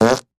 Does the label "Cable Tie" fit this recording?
No